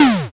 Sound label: explosion s